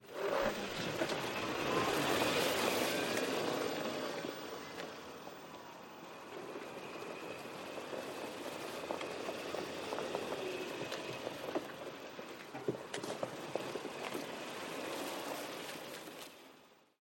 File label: golf cart driving concrete mono; soundscrate